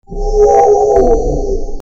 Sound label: demon dying, demon; dark souls